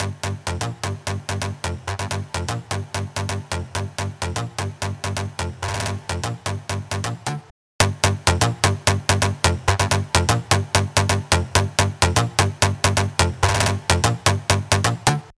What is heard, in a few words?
Drop Lead Attack Transients